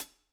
room Cl Hat, drum

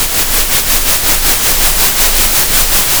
pop, Sound of a train

